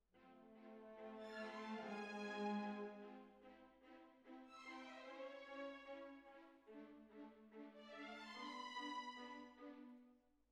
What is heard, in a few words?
Cbasses